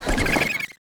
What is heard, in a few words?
WAR WALK; THORNASH; Mob